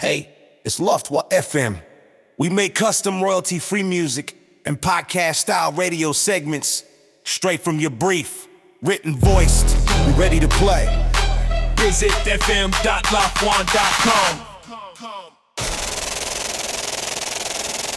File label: HipHop, Musical radio segment with upbeat boom bap energy; 20s cut; HipHop bumper; Music + voiceover demo